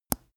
teeth clench bright, koerpergeraeusche; menschen; soundpool

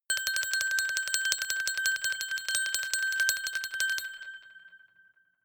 glockenspiel sparkle tremolo high

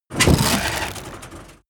van engine damage; verkehr transport; autos

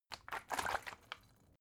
bottle water big shake single soft; gastronomie; berufe

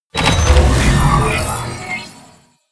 sci-fi click choose character, hits impacts

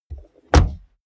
car bmw e46 exterior door rear close; Cars

